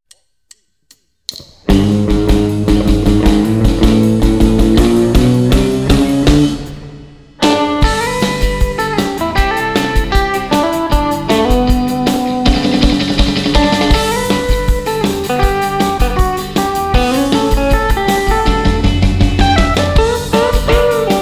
My Bonnie Lies [Minus Bass Guitar]

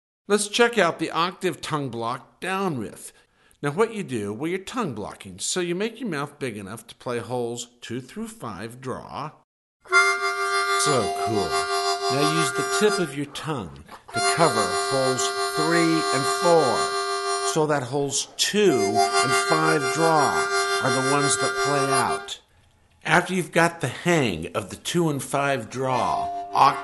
Octave Tongue Blocks, harmonica

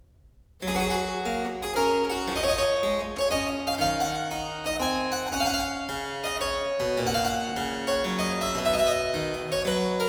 Ground in gamut, harpsichord; Complete Chamber Music; Brilliant Classics; Miscellaneous pieces for the harpsichord

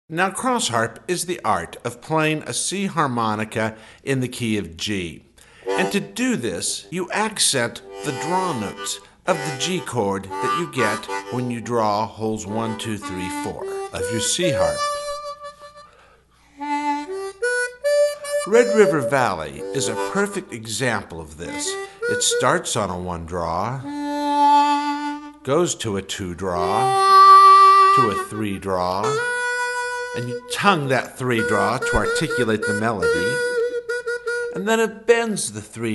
Cross Harp, harmonica

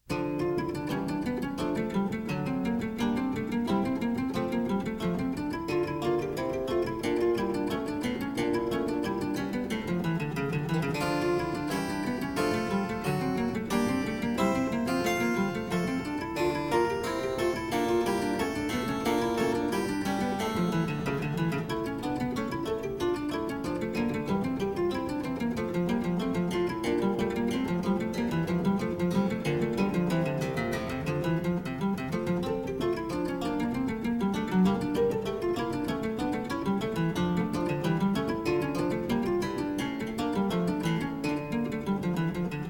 Suite in D Minor - Variation, Harpsichord; Suite No. 3; HWV 428; Handel; Suites for Harpsichord

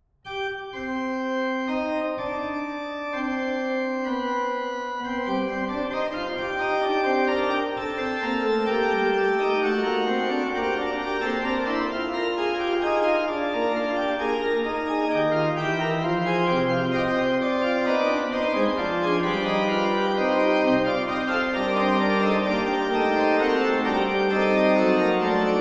Fugue in C minor (unfinished), Organ; Bach; Christopher Herrick; The Complete Organ Music; BWV562ii

